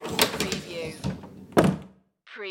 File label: Closet Door Open